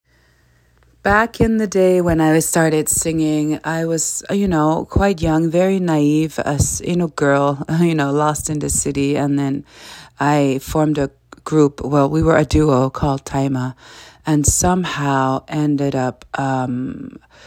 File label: Best stage crowd